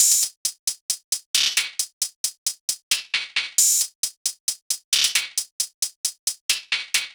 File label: Hihat Loop - 134 BPM, Hip Hop; Stinger